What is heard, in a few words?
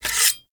Dagger Equip; Warframe